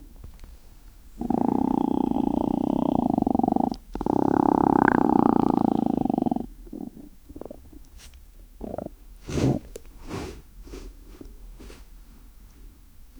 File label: inside a giantess stomach, body